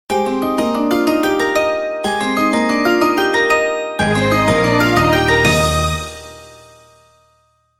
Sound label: Tone; Samsung; SGH-A687 Strive